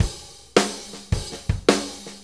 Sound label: Blues Shuffle, Drum Loops